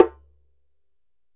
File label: DJEMBE, African Drum